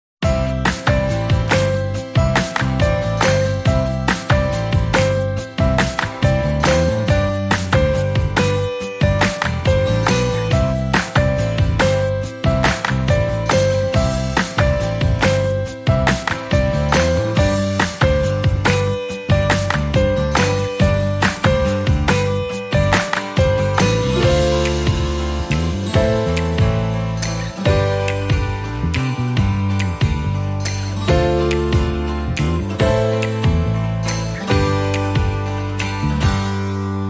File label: No Vocal Shouts No Glock No Marimba